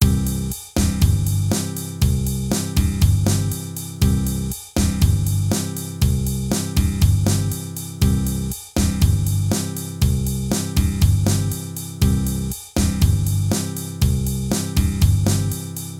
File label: Bossa nova